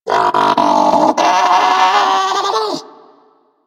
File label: Kubrow Player Lure Call; Venus; Animal Lure; Orokin